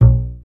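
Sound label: string contrabass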